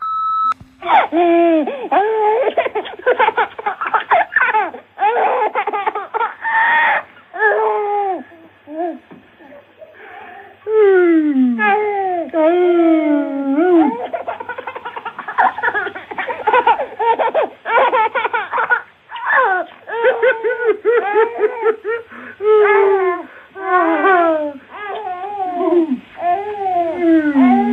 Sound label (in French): Rire d enfant repondeur